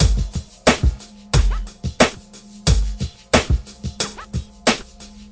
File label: hiphop moving along, 090 bpm, drum loop